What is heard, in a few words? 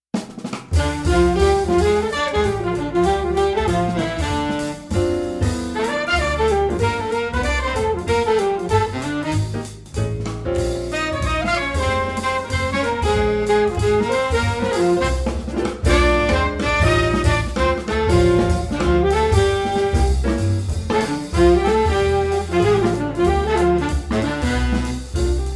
Brassy Rain